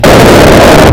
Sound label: hmmmm